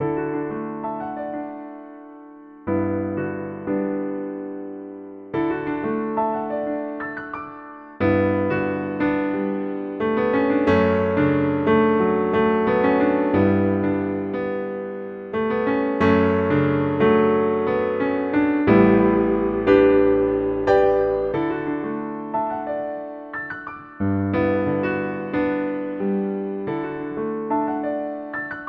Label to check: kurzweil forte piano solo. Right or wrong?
Right